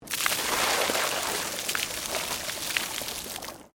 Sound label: Water Pour Concrete Medium; Industrial Water movement; Nature